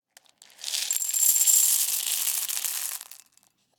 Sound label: Pour cereal into Glass Bowl; Glassware